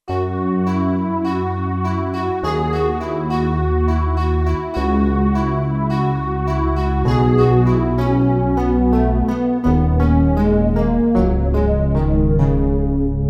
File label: Kurzweil K2500X New Age Organ